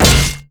basic attack; renekton skin06

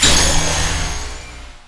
power transfer return; LoL; viktor

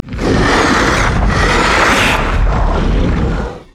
spider idle; LoL

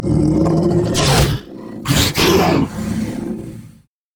worm idle; LoL; monsters

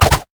tiger attack; LoL; udyr

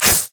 basicattack; LoL; irelia

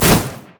shadow swing; LoL; zed stormninja

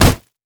mech melee basic attack; minions; LoL

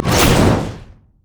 flamebreath human; LoL; shyvana

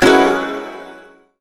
guqin basic attack; LoL; guqin sona

